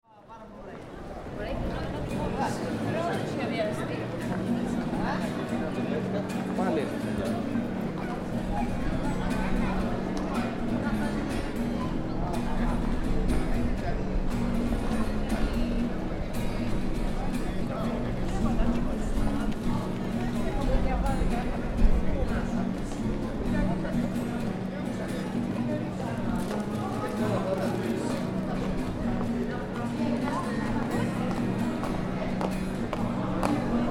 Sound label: Street Music Busker, Man with acoustic guitar busking, singing in greek; People walking by and talking. Occasional motorized traffi